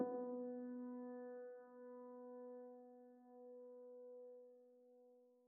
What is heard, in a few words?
City Reprise; Forest SFX